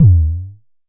Jomox MBase, drum machine; Jomox MBase 01

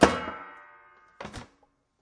metallic clonk; Weird and Interesting Hits; single hits

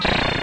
c64 sid noize, Commodore 64 8bit SID Drums; LO FI and 8 BIT KITS

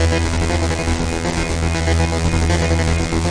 belgian synth wash; SYNTH AND ELECTRONIC

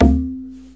"dhin" slide, Tabla

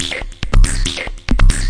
140 hexbeat, DRUM LOOPS and BREAKS

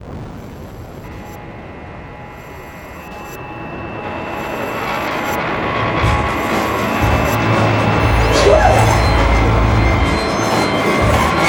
static nightmare

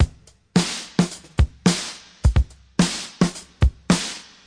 bigsnare, 106 bpm, drum loop

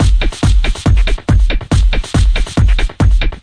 140 dump the pump techno

